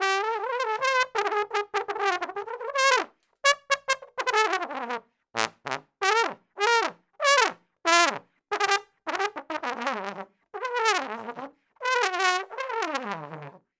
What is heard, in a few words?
Trombone Free; Traditional; Sonic Foundry (Sony Creative Software); Jazz Solos and Sections